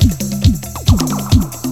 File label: 138 DISCO, Disco House; USB Soundscan vol.20; Fresh Disco House I; AKAI; DISCO ADD